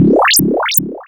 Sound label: Cry